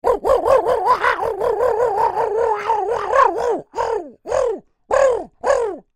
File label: Creature Oodle Bird Calls Flustered; Birds; Animals; Big Room Sound